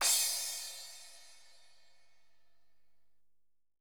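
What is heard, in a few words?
percussion, house, 125, drum; eJay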